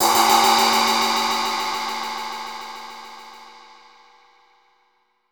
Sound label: ride